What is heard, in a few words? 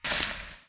bullet light ballistic sand debris; Titanfall 2